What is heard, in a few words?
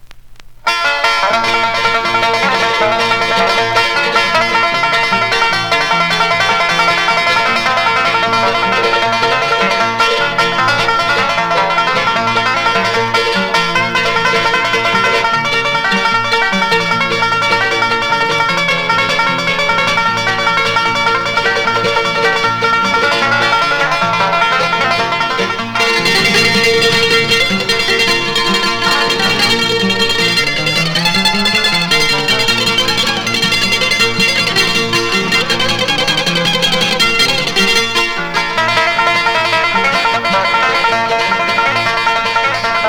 blues